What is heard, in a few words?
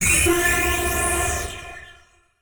Transition Hit Impact Electronic; Production Element